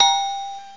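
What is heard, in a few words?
Tinkle Bell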